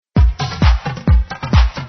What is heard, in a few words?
synthesizer